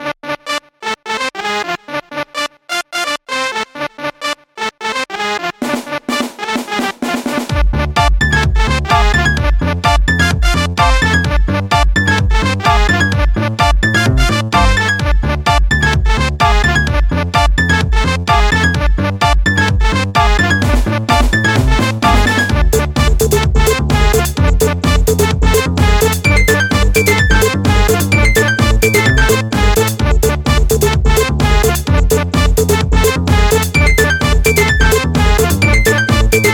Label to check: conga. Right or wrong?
Right